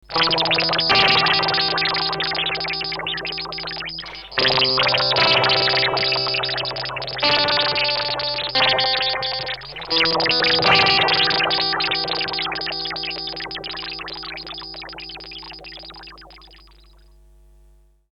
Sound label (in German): Red Panda Radius Freq Shift mit LFO